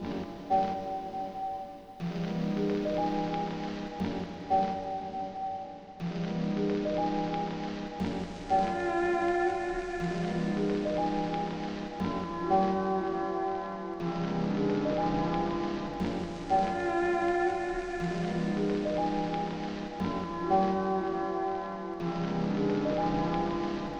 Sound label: RINGTONE